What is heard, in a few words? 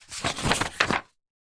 household book artists sketch pad page turn; zapsplat